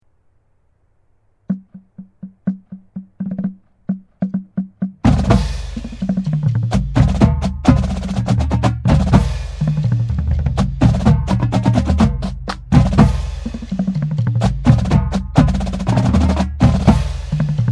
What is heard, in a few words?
sticks and swing, percussion